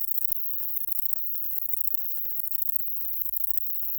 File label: song, katydids, neduba arborea